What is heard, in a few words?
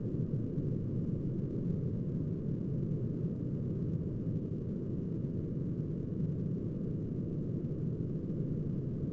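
crate interior ambience; Star Wars Obi Wan; XBOX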